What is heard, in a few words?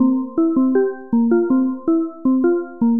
Lead Vibes, Drum & Bass Keys; Sonic Foundry (Sony Creative Software); Knutrix Drum & Bass